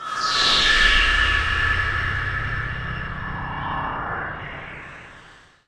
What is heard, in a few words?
fx reverse; Ueberschall; Techno Trance Essentials